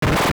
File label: Door, Unlocking a dungeon door; AOL